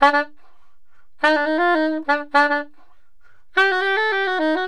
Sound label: SAX, Balkanish Traditions